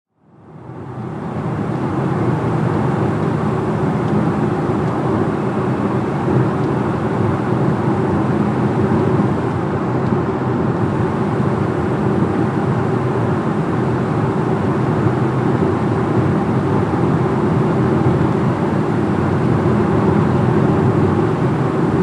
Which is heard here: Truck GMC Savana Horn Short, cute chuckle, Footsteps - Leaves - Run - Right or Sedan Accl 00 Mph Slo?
Sedan Accl 00 Mph Slo